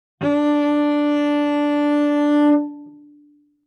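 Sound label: Cello arco fortissimo sul G D4; MIS Pitches - 2014; Strings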